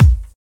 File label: prominent bass drum one shot b key